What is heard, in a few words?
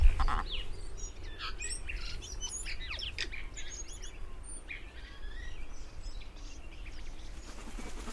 tui trill song; at tawharanui